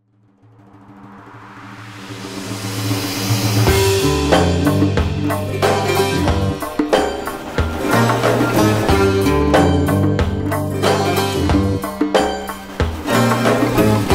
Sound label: Iranian Music; Gta San Andreas; زنگ گوشیم